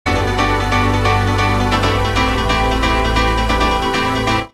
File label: piano